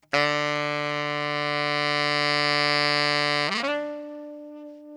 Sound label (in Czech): saxofon